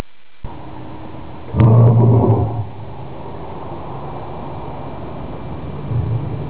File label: lion